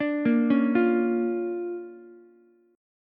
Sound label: D-Minor Major 7th Sharp 5th-D-down-Ukulele; DmM7#5 arpeggiated; JGuitar